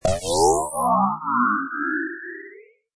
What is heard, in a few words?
bonus